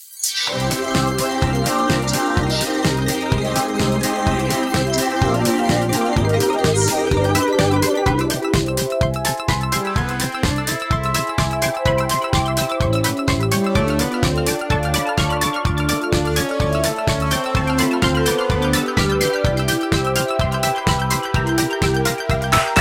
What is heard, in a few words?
bgm